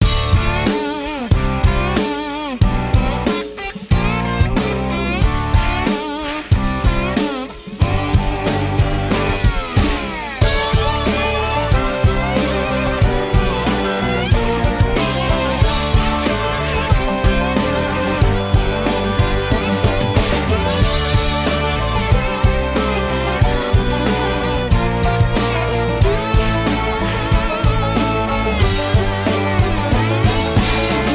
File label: kneeslapper music; left4dead2; kodua; fortnite emotes